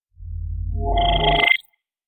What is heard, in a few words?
Space hole